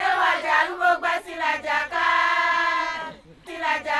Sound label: CHANT, 120